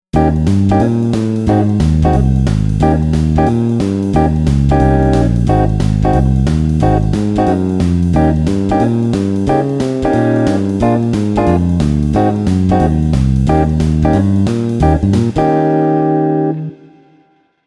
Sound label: blues, Basic blues and early rock styles will often use a dominant form of I IV and V; The effect is a mixolydian sound, which feels more open and lighter; altered chords